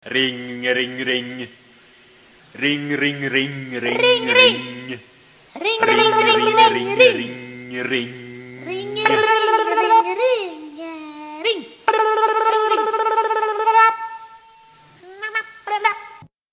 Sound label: Ring Ring ring